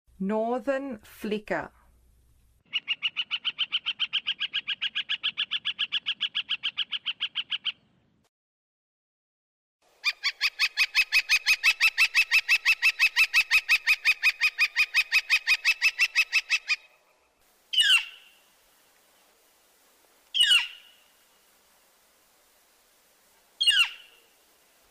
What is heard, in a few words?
Northern Flicker, Songs of Birds of North America; Western USA; Western USA Songbirds